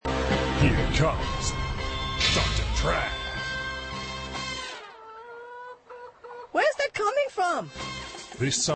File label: text tone; here comes dr tran